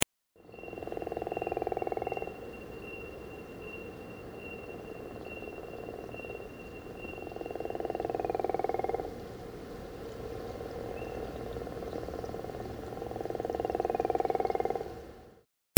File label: gopher frog